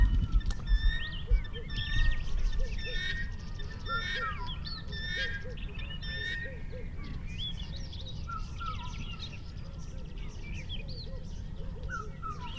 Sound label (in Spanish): gallina guinea, cantos, aves